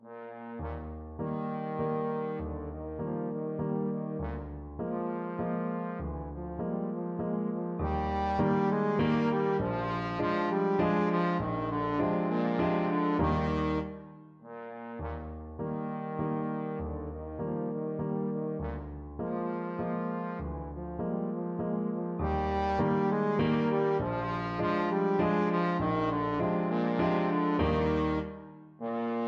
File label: german dance, trombone